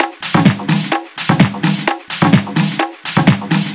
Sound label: Percussive Loops 128BPM; Vengeance Samples